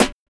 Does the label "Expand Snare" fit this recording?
Yes